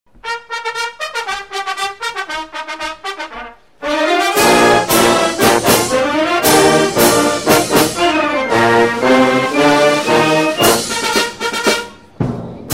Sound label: American March Highlights